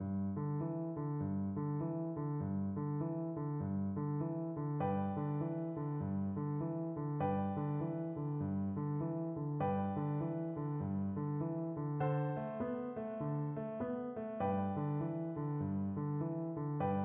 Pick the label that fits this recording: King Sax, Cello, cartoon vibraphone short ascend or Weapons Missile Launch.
Cello